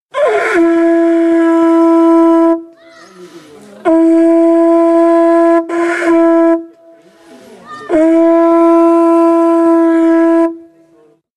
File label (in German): Trompetenschnecke; typisches Instrument in PNG, mit dem zum Gebet gerufen wird; Papua-Neuguinea; Weltgebetstag 2009; Gastgeberland:Papua-Neuguinea; Singkreis